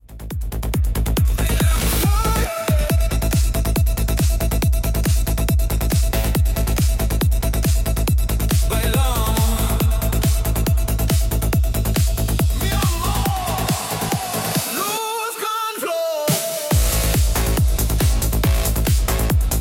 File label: hardstyle remix